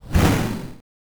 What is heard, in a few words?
Retro Impact LoFi